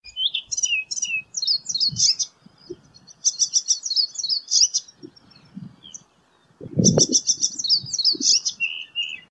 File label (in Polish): zaganiacz, ptaki